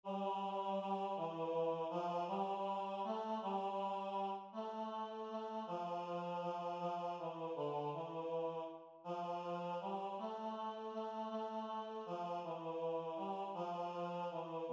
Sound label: Baritone Bass